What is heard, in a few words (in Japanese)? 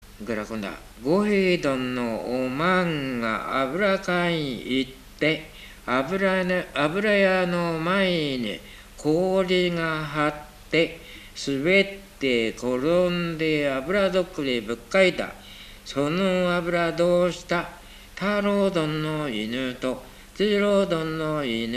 warabeuta